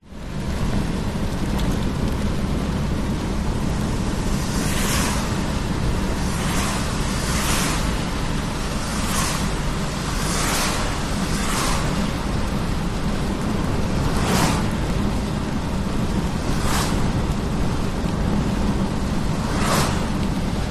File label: vehicles car drive 70kph wet road rain windows open cars zoom past; zapsplat